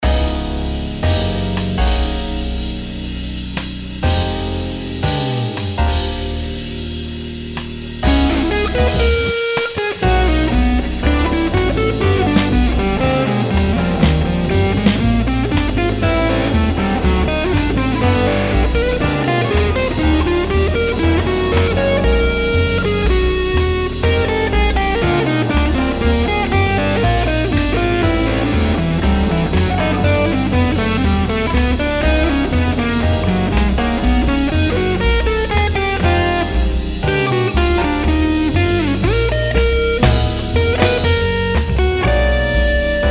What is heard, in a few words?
improvising mix, guitar